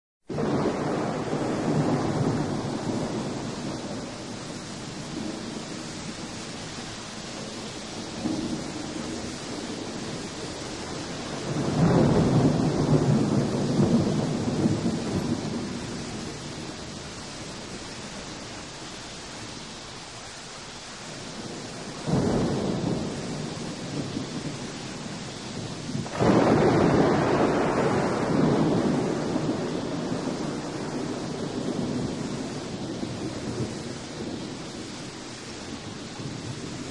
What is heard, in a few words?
Torrential Thunderstorm; RAIN, THUNDERSTORMS; Sleep Sounds of Nature